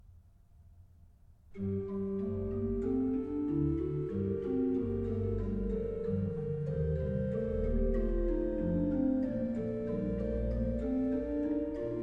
Ach wie nichtig, ach wie flüchtig, BACH ORGAN, Chorale preludes; Orgelbüchlein